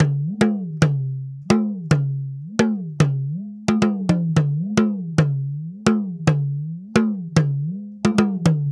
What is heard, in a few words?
Talking Drum, African; Sonic Foundry (Sony Creative Software); Continental Drift Aftershocks